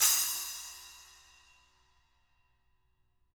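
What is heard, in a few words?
SPLASH 10 HIT, SPLASH CYMBAL; SPLASH CYMS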